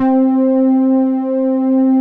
PADS; Pro-Rec - Synthsonic; Z1 PADS

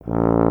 tuba; Miroslav Vitous; Solo Instruments VOL-2

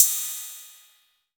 TR-606 Cymbal; Roland BK-5

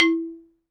XYLOPHONE F#; Synclavier; World & Orchestral; ILIO

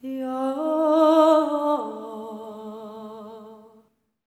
SERENE, Symphony of Voices; Spectrasonics

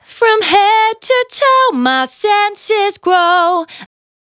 Finally 70bpm C, Velvet Vocal; Zero-G

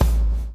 BLOW; Spectrasonics - Backbeat

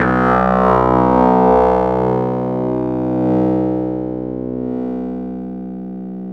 BUZZ BASS; Zero-G Ambient 1

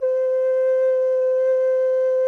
SOP C, GEMSHORN; SoundBytes - Early Patches

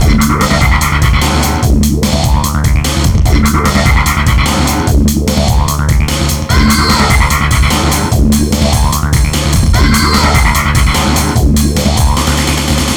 Hardcore Breakbeat, 148 Dmaj; Big Fish Audio; Hardcore Breakbeat Action; Get Up

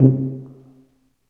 TUBA C, stacc; Roland L-CDX-03 Disk 2

